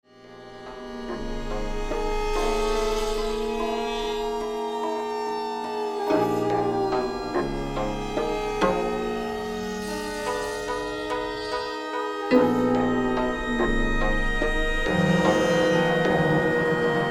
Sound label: gugak orchestra